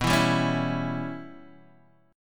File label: C sharp Minor 7th Flat 5th B